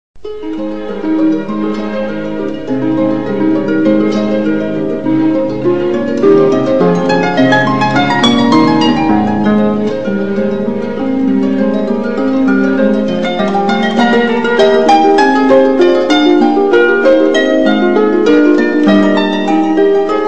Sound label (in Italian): nuovo intervallo rai